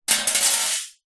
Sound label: metal basket small drop concrete; zapsplat